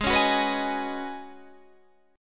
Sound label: C sharp 7th Suspended 2nd Suspended 4th G sharp